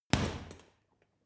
boxing punching bag hit wiggle; sport-freizeit; boxen

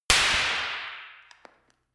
gun clapping pop gun; schusswaffen

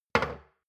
shot glass place table medium; berufe; gastronomie; soundpool

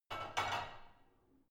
barbell only replace unsteady soft; sport freizeit; fitnessstudio

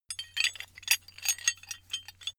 metal handling pieces

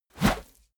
whoosh dirty; swooshes-swishes

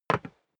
step boots single wood parquet single stair down; schritte

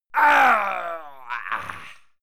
male fight pain; soundpool

